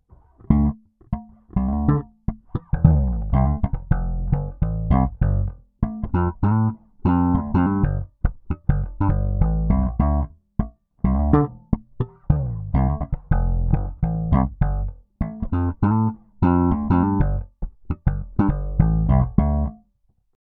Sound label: Peavey Stock Slap Tone DOWN; Peavey based pickup Shootout